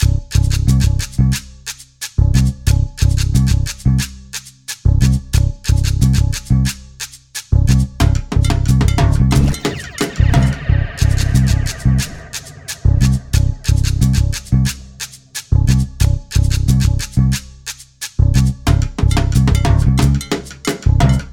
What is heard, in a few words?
Bassline